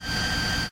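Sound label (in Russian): гул от фрезерного станка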